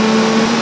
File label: airsuck loop